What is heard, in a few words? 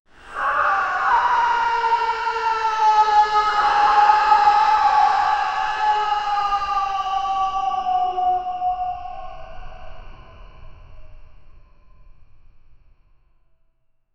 a chilling blend of a, animal cry